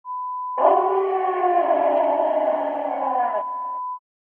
Werewolf sound

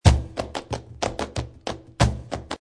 VERDIAL 185, flamenco

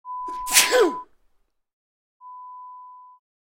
Male Sneeze

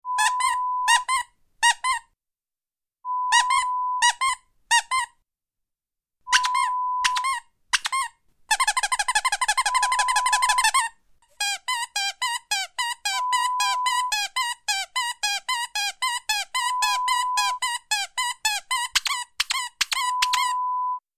Squeaky Toy sound

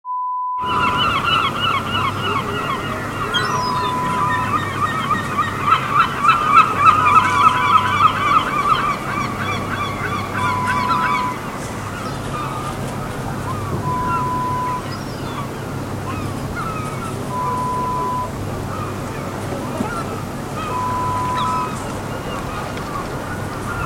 Harbor sound

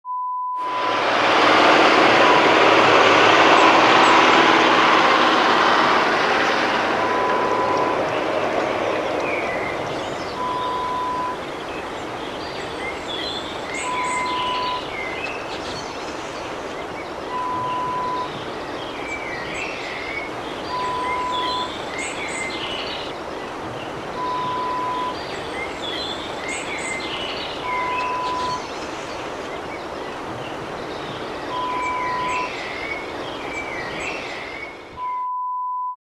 countryside ambience sound

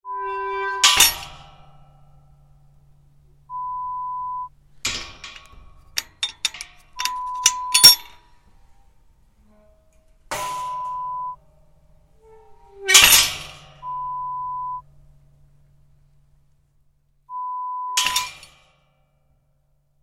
Metal gate sound

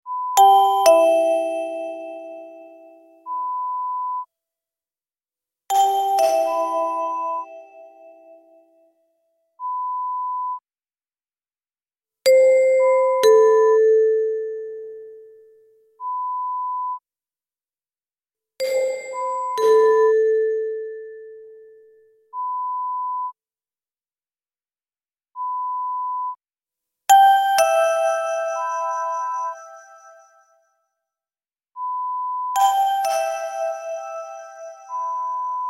Ding-Dong Collection Lo-Fi

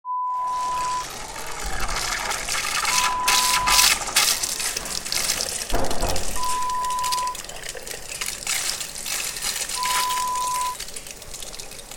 Public Toilet sound

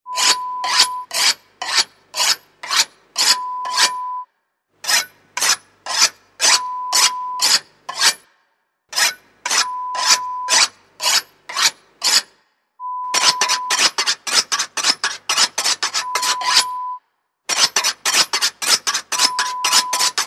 Knife Sharpening; Horror Sound Effect; Lo-Fi

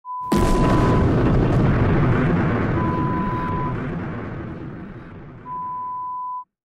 Explosion sound

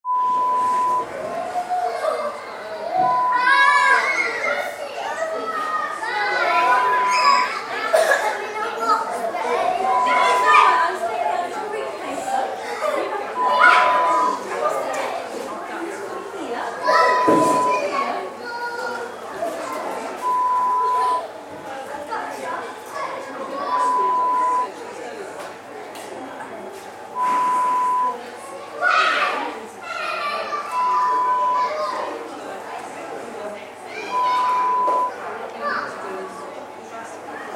Children Playing inside sound

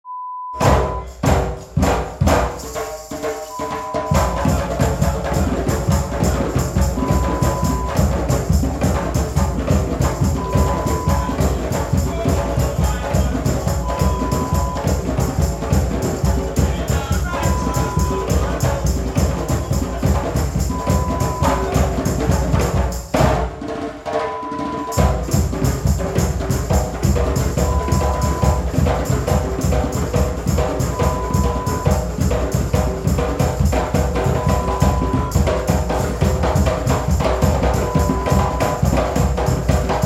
tribal drum sound

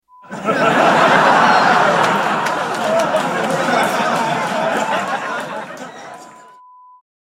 Laughter

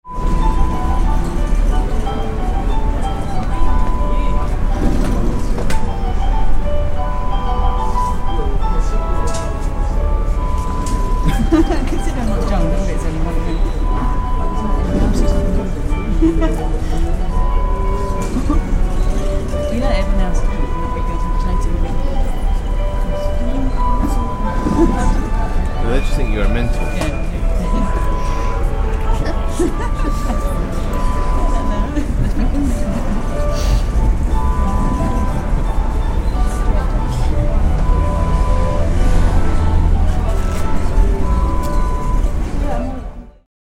Mall sound

